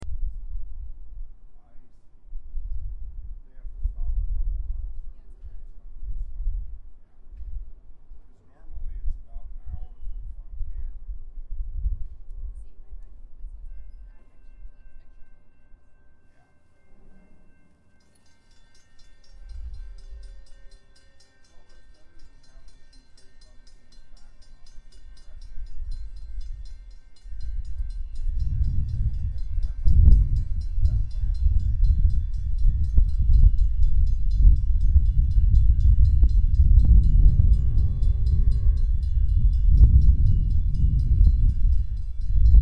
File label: Metro Station